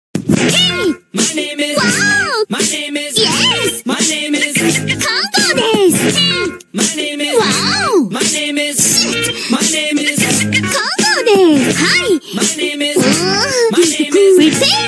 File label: Anime Ringtone